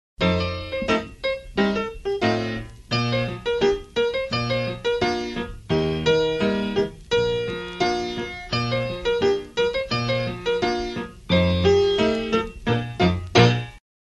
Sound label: cakewalk kicks